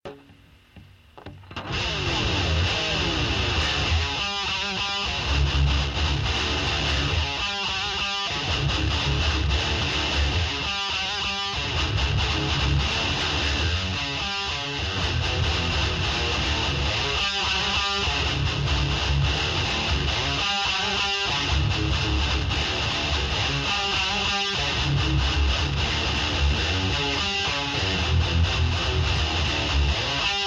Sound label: Riff Tapes